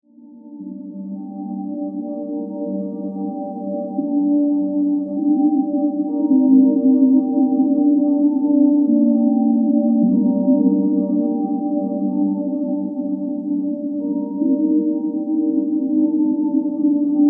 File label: Ghostly Textures